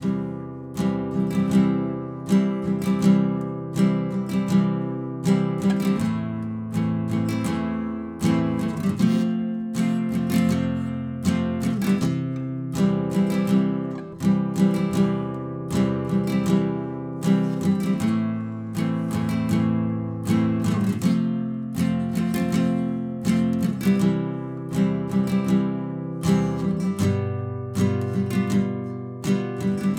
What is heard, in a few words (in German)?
Whiskey in the Jar Gitarrenbegleitung